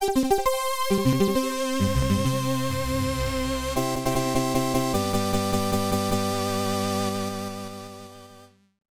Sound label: Harvest Festival Fanfare; princess maker 2